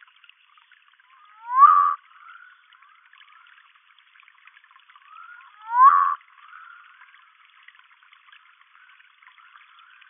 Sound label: Nyctibatrachus humayuni